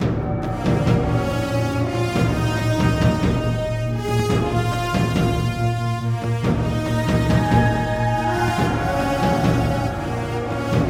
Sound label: BATTLE, music; pixelheros